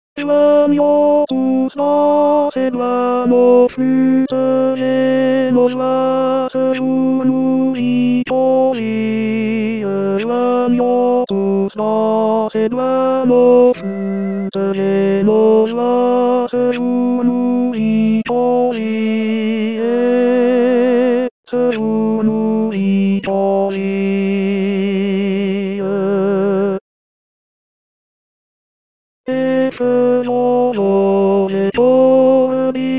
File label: tenor; joignons flutes do